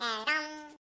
yoshi tongue

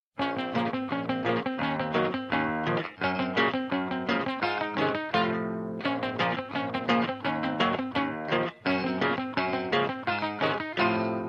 Guitar

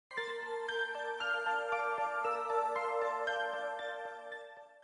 gentle tone

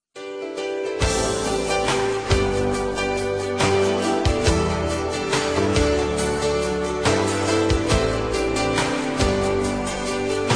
mandolin

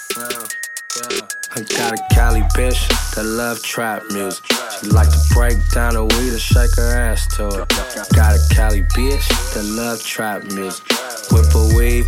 Marimba, ringtone

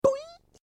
Boing

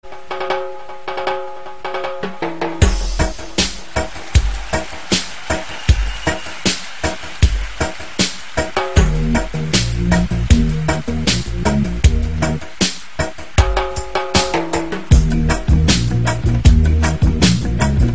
reggae mix; ringtones

